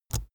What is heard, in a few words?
keyboard laptop enter push release; kommunikation; computer